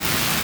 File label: laser burn